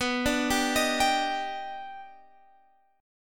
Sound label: Eb Augmented Major 7th B